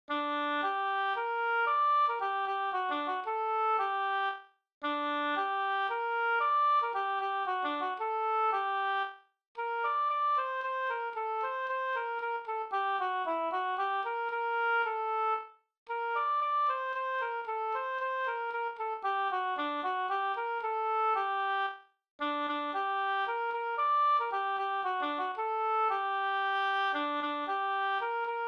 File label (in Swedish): slängpolska